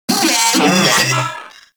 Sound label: Moa Vocal Enemy Spotted; Warframe